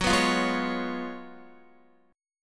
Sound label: Gb Major 7th Suspended 2nd Suspended 4th, Guitar; GbM7sus2sus4 strummed; JGuitar